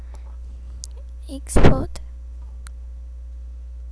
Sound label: explode